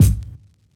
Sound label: drumbreak kick; Hiphop